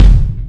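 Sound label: kick, drum; drums; virtual drum sounds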